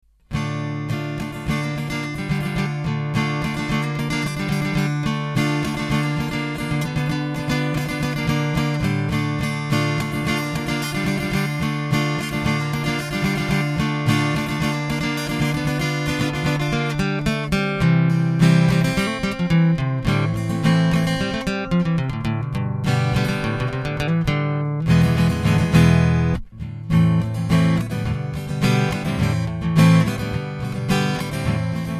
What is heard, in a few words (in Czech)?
kytara; AURA